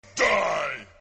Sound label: die sound effect